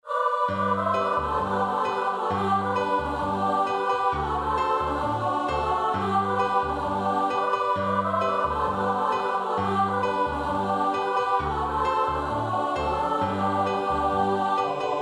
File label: mango walk, choir